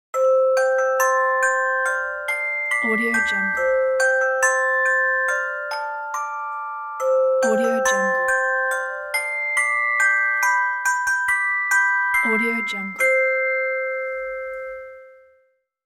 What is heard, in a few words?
childrens story musical transition